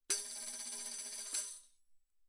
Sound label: tambourine shake roll mf; Percussion